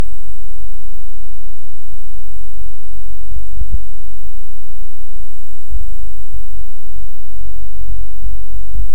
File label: Hydrophone; OneOcean